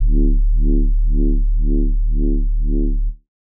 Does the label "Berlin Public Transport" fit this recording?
No